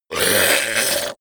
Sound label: zombie grunt choke male; kreaturen